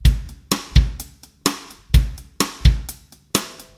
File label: 127 bpm 00s drum loop sample g sharp key